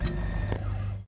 reagent appear

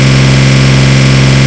l6 diesel large, engines; acf engines

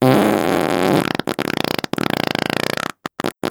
fart

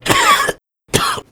cough

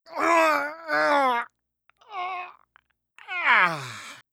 grunt

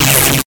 zapper

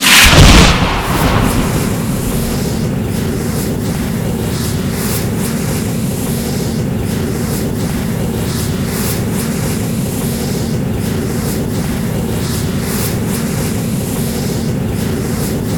dragon fireball

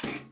oven open

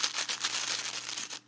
joint fold finish

